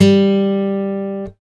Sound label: GUI AC. G, Ac. Guitar; Sound & Vision; Gigapack I CD 2; GUI ACOUSTIC 1